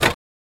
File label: lower a pinball flipper